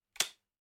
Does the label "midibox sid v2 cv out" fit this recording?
No